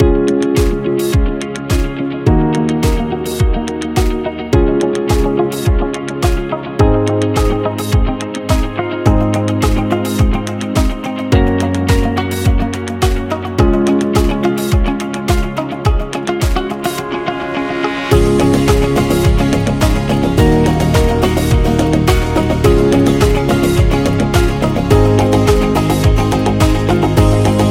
Friendly Background; waiting music

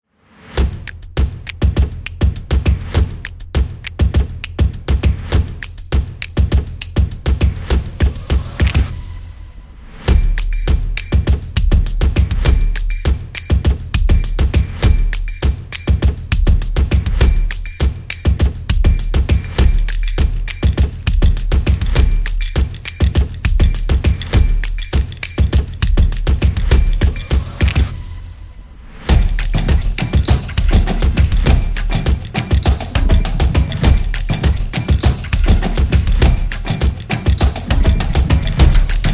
It Is Drums; energetic percussion

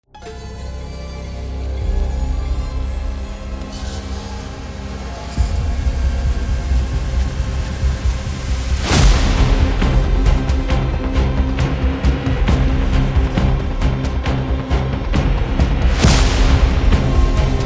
Psychological Horror

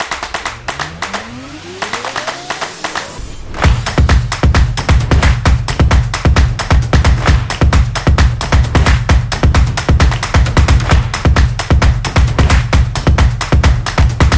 This Is Drum Opener

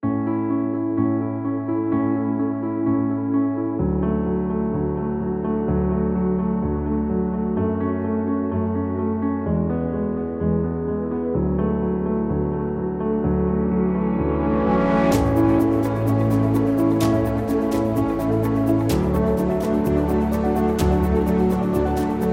Energetic Motivatinal Emotional; corporate music vol 11

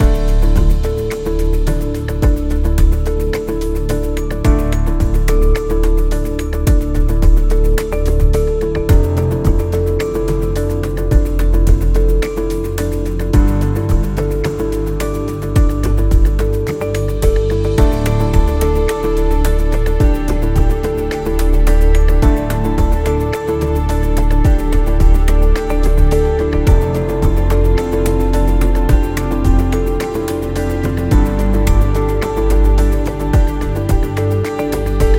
Tech Loop Futuristic

